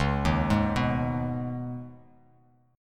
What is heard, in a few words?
Db 7th Suspended 2nd, Bass Standard; Db7sus2 arpeggiated; JGuitar